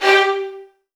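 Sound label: VN FX G2 left; ILIO; Kirk Hunter Virtuoso Strings VOL-1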